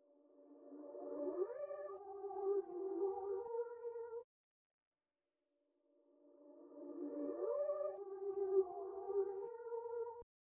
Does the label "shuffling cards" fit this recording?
No